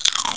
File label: attack bite; Exile III v1.0